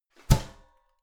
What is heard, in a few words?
garbage can close lid hard damped